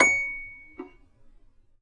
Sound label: Db6 HARP; USB Soundscan vol.62; AKAI